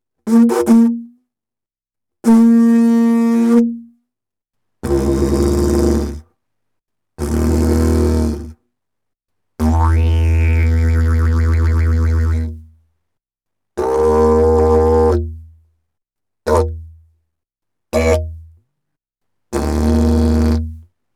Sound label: Didgeridoo And Overtone; Good Stuff Audio